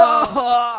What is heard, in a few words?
snowball haha